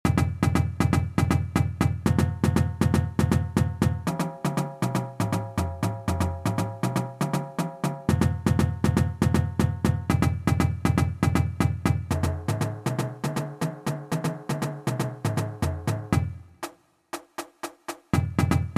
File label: Double Beat